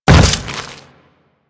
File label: Step Goo, Footsteps; Enemies; Warframe; Grineer